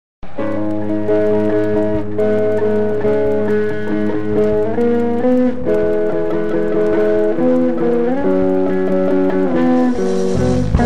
guitar

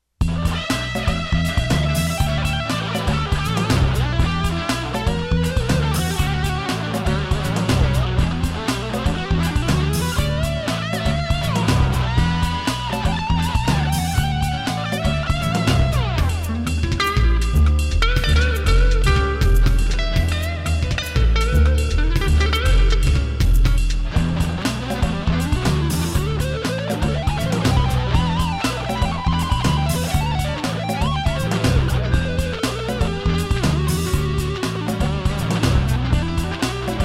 funk

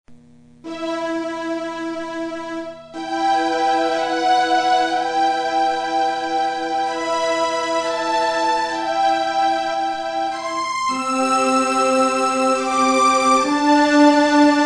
instrumental

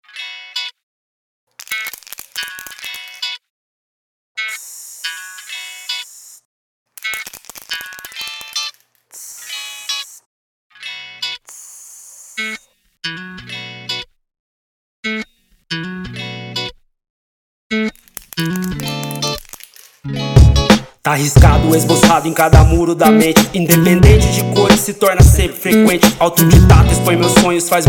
interlude

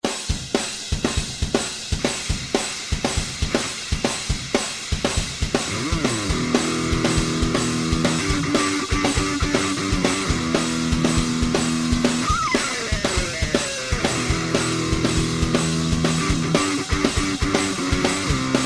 riff

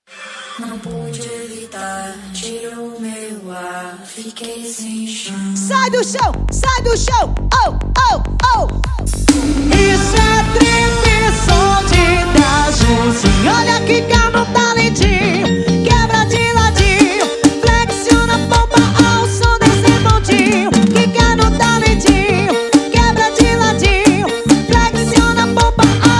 trip sound

